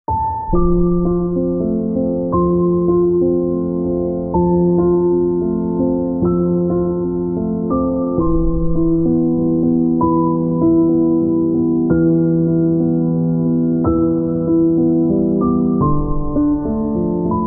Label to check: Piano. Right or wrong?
Right